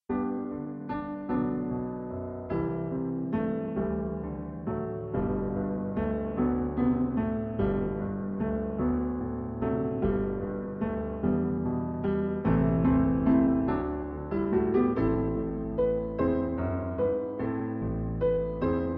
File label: piano